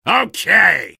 positive vocalization; soldier

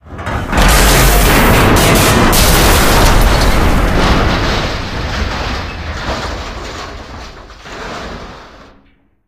crusher final impact

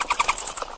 jungle startup; windows 9x; sound schemes

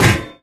physics turret impact lg

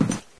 wood, player; Source Engine; dod

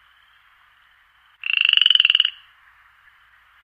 animal call frog loop; Source Engine; tf; lair

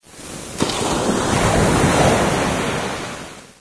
wave, water; ambient; Left 4 Dead 2; Source Engine

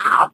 ballbot hot laser

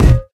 physics cube

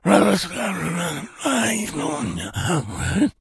mumbling, infected idle

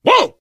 reaction startled, player survivor voice biker

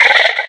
box monster chitter

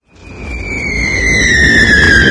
mortar incoming; weapons; source engine; dod

